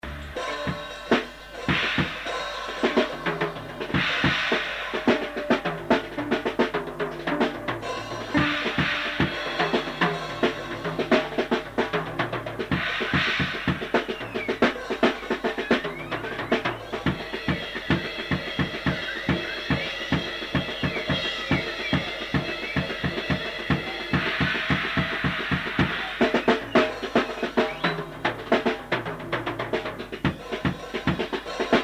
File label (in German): Drumsolo